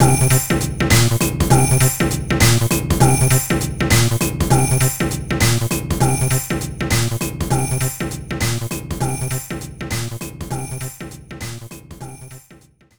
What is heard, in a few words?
Work Loop; Tamagotchi no Pichi Pichi Omisecchi DS